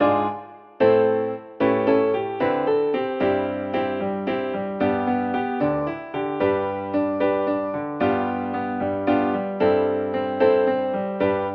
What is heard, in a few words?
Amen accomp